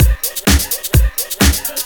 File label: Straight Loop 128 BPM, House